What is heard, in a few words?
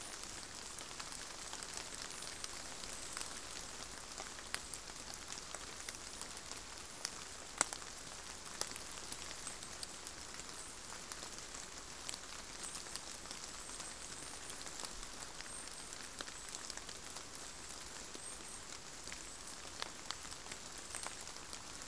Rain light consistent leaves; boom library; Thunder And Rain